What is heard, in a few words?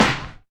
Snare, Alesis DM5 Drum Machines